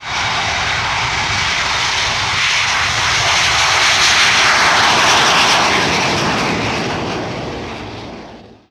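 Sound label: bac 1-11, aircraft; robin pinnock; simutrans; Pak128.Britain-Ex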